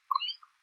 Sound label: Chirp